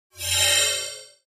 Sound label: Mouse Over Elect, mouse over